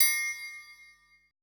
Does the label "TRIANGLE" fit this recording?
Yes